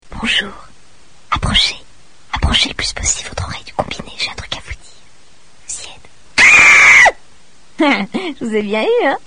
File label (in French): cri; repondeur